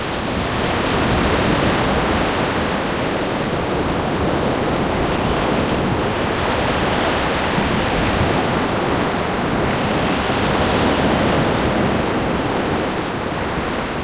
outside ship, borealis ambient areas; hl2

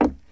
wood, player footsteps; hl2

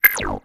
Analog Synthesized UI Lock On Engage; The Chris Alan

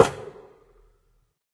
bounce, antlion guard; hl2

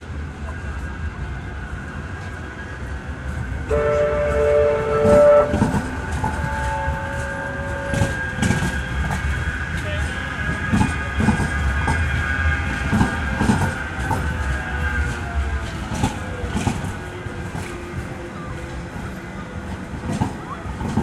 Tram By Pass, Manchester Ambience; InMotionAudio

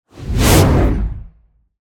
Pure SciFi - Whoosh Fast; Rescopic Sound; Distinct Whooshes

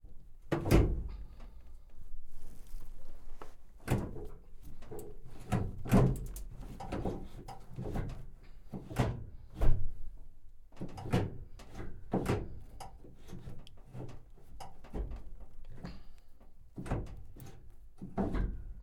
BRDM Interior Instrument, BRDM-2 APC; 1964; CMC6XT; MK41; MK8; Pole Position

